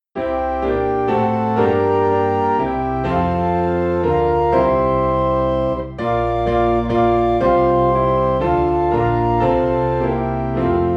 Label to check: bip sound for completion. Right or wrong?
Wrong